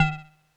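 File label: Drops Kawaii D#1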